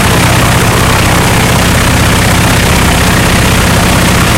wildcat side, engines; War Thunder

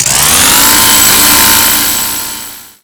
minigun spin; Modern Warfare

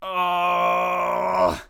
death; Escape from Tarkov; usec2

